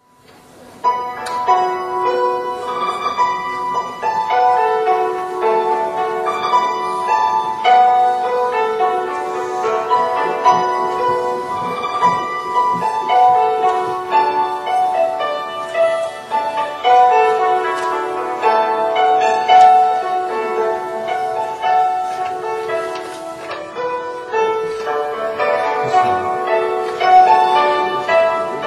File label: yangqin